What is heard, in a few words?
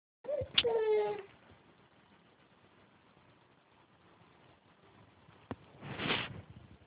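baby hungry, female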